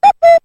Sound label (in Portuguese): relogio cuco